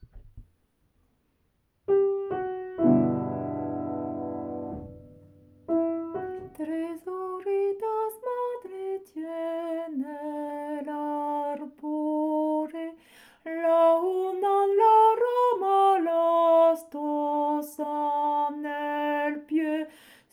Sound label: alto; tres hojitas